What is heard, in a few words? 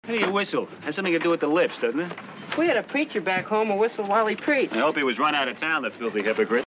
whistling preacher